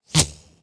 tracer pass, guns tracer; World of Tanks Blitz

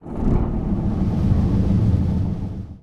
leo2a5 engine int start, tanks; engines; War Thunder

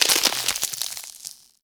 pick ice cracking stop; footsteps; Call of Duty Modern Warfare

